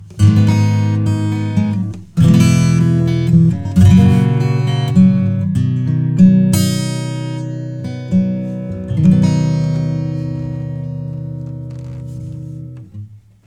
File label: acoustic Guitar Electric Presence; Kelvin